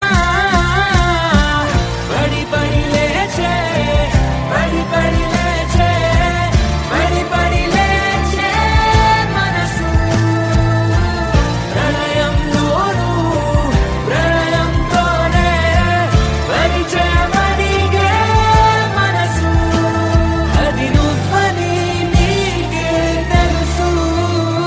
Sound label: Telugu Ringtone